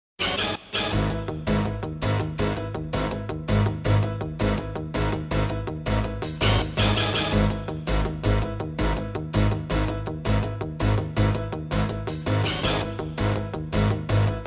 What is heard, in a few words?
Jingles; 90 DDD Jingles